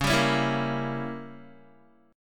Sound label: Db Diminished 7th Db down Guitar; strummed; JGuitar